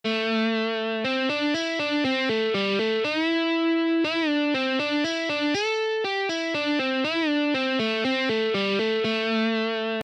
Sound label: Guitar exercises